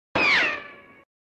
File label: ricochet; disney